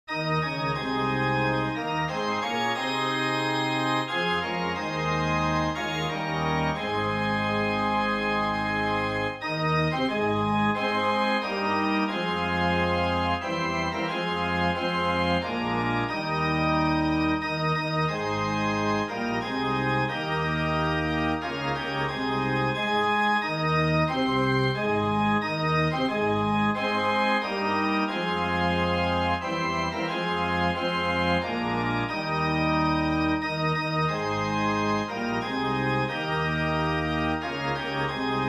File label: the star spangled banner organ